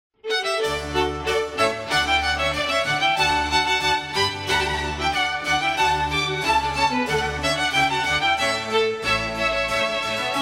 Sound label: Strings Rondeau